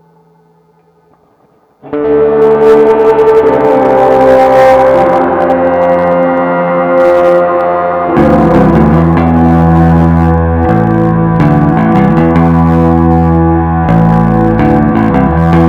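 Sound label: Monitor Mix, FULL BAND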